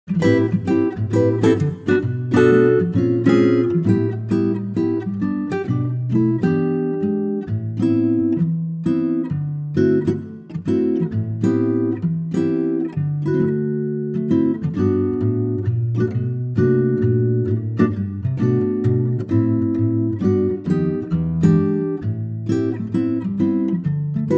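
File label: G acoustic guitar; key - G - vocal range - B to D (optional E at the end tag); Superb acoustic guitar arrangement of the classic standard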